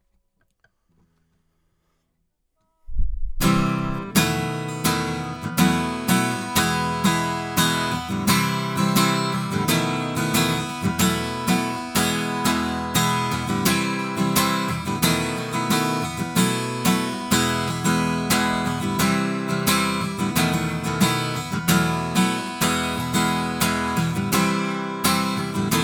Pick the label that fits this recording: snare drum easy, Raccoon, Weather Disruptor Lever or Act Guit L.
Act Guit L